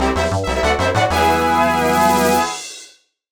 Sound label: Race Fanfare; super mario sunshine unofficial soundtrack 2002